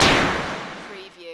Space Scifi Sound - white blast

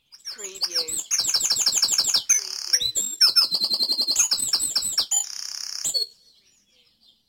Dawn Chorus in Mold North Wales

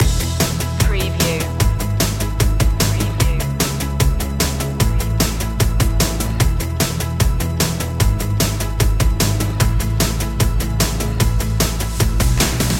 sparks of light loop

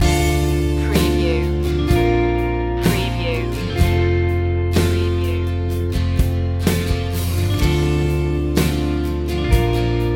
crashing waves loop

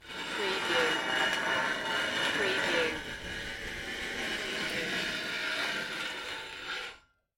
Metal Slide - Rock Grinding Against Smooth Metal

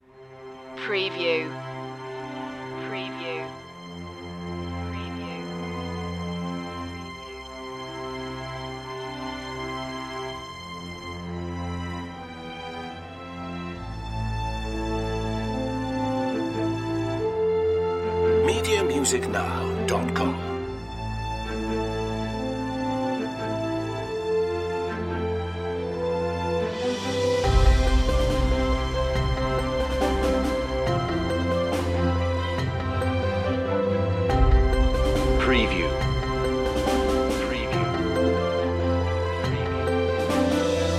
action adventure hopeful

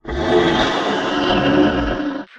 Monster Breath Gasp

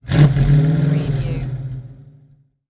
Mustang 68 Fastback Rev Exhaust Perspective

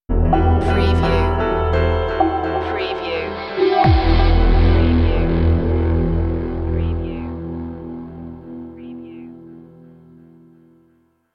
plurality move stinger

